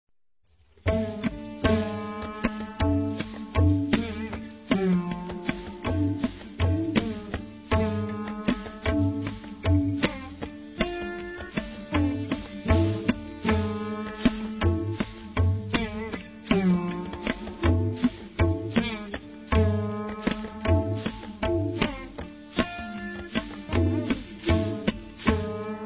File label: Fusion Music Raga; RKC Live Stream; Travel India